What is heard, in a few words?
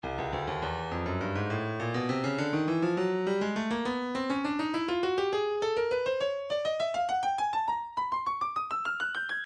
breakbeat 102; troxie; Stylus Breakbeat Midi Files